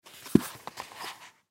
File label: Stiletto Heels Up, Stiletto Heels Pick Up Carpet; Shoes; Foley; Objects; Blastwave